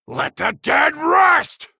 Male Human Attack

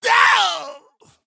Male Human Death

